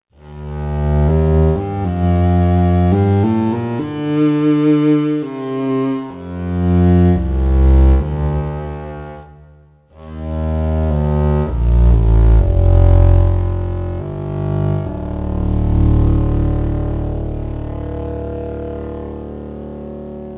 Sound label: arco bs